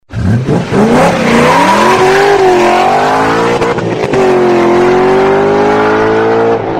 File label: BMW Z8 Hamann